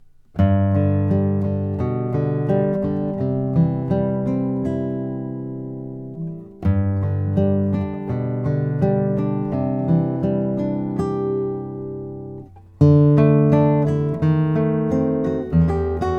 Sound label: lowden s50j brazilian rosewood